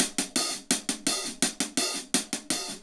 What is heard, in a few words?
HAT, HI HAT LOOPS, Dub; Drumdrops In Dub VOL-1